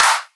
Huge Clap; Drums; One Shots; Claps; House

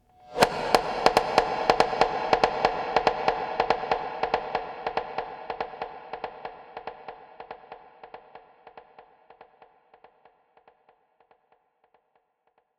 Conga Illusion 142 BPM; Psytrance; Ethno Box

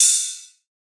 ride, cymbals, drum one shots; Rides; Cymatics; Cobra Hip Hop Sample Pack

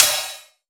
Eucalyptus Ride, Dubstep Cymbals; One-Shots; Drums; Ghosthack; Ghosthack - AC2024 - Day 13 - Dubstep Sounds 2025

